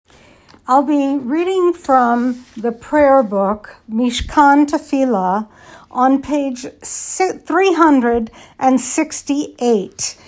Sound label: Melody before Torah Brachot